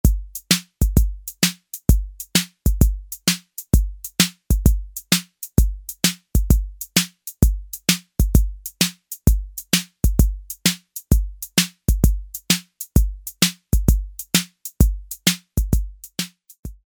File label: SHUFFLE, Roland TR-808; Factory